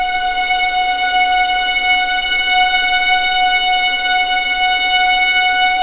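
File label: F#5 legato violins; East West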